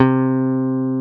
BAS C3; Best Service Gigapack 2

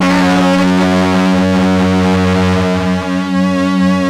LEADS; Masterbits; Vintage Voltage

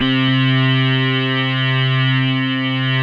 DW8 DPL C3, Korg DW8000; Back In Time Records; Korg Universe VOL-1

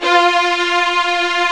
STR, Vls Agitato; Roland L-CD702

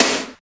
SNR, Tekno Industrial; East West

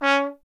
Trumpet C#1; Miroslav Vitous - Solo Instruments VOL-1

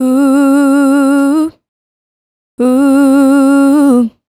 Female Vocal; Vocals; Sonic Foundry (Sony Creative Software); Mac Money

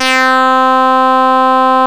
frog, synths sh101

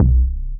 Bass; M8; Electronisounds; Enigma Dub Cube One Shots

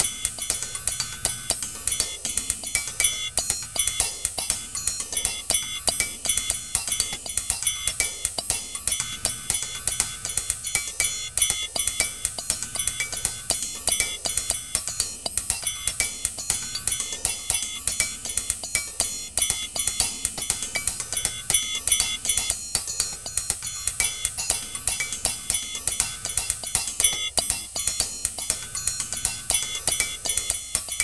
Bellz N Hatz More, Percussion Combos, 120; M-Audio; Pro Sessions VOL-38; Abstract World Fusion 2

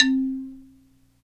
XYLO, Afro Xylophn

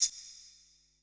E SHELL, ETH PERC

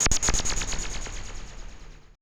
AMBI FX

